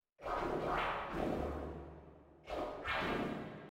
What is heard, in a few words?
field recording